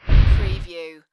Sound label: Fireball; Action, Impacts & Explosions